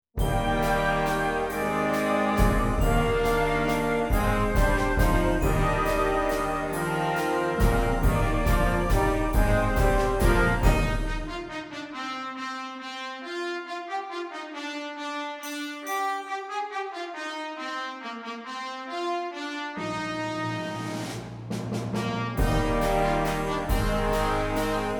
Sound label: Waltz